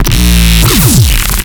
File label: Sound FX Lazer Gun